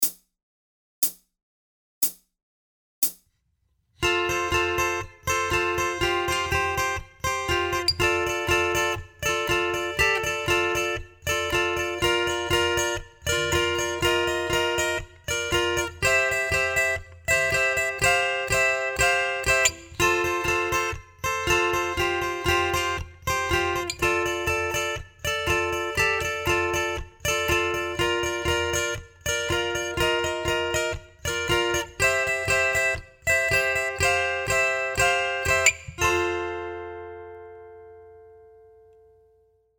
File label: Triads, Slow, guitar; Triads Unleashed